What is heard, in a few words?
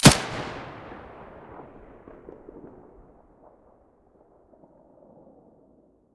muzzle stereo